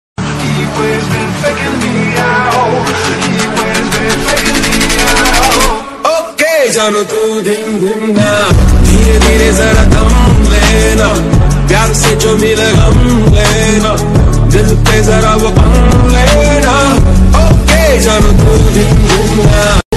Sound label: love ringtone